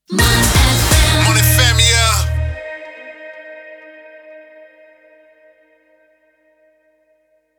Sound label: Jingle Sting